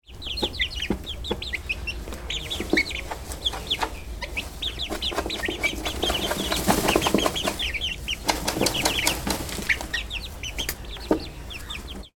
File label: animals birds chicks incubator public farm people in background; sound effects one